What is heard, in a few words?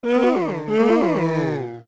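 Gibdo Chase Moan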